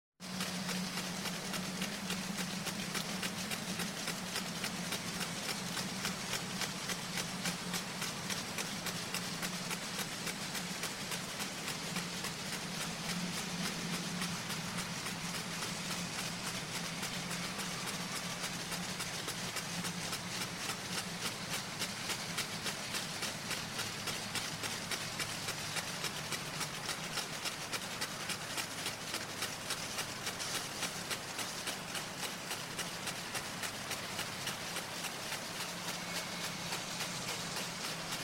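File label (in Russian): Разбрызгиватель автополива ороситель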